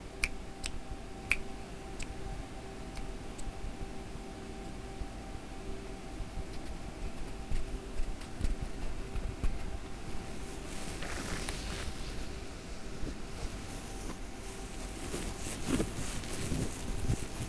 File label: ASMR